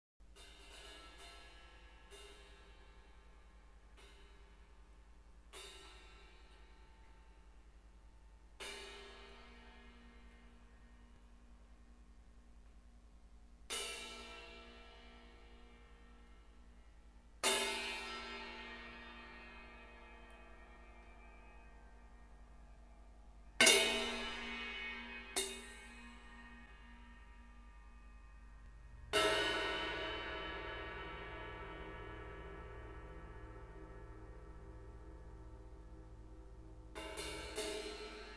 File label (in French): cymbales